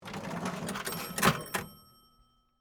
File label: Cash Register Close, Industry, Cash, Register, Close, Drawer; Bell; Cash Registers; BlastWave